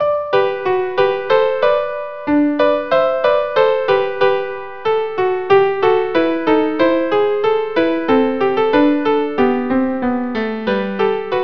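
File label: Bruchim Habaim violin viola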